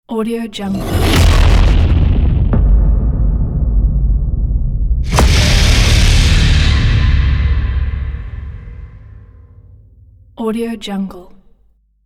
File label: nightmare ident